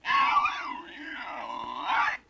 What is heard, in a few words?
LoFi Synthetic Squawk; Vintage Cartoon; Comedy Vocals